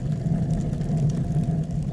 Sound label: Lantern Burn